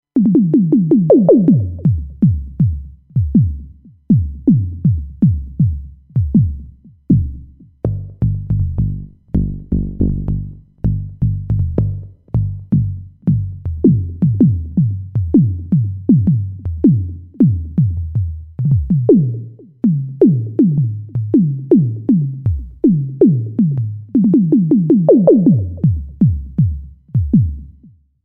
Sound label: Lancet resodrums